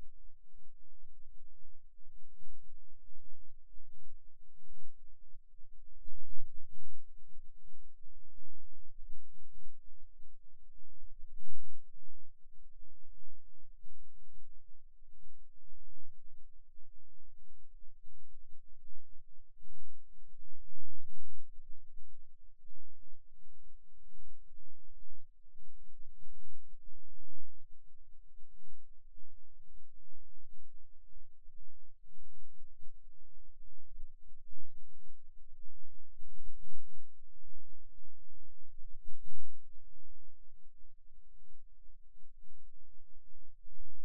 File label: Rhythm Wave, eeg seizures